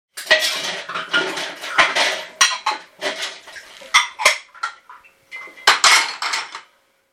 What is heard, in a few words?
Kitchen; Audiosoul